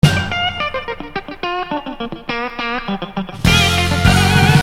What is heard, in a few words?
chicken pick